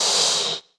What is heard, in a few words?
run